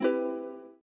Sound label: cancel